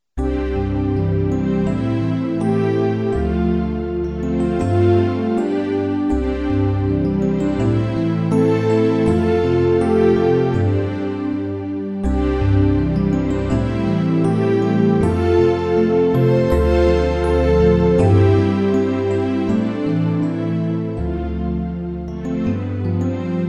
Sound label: Improv chords Solo